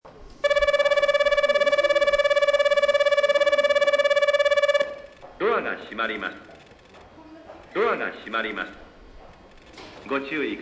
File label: bell, station; tamatsukuri; osakaloop; jrwest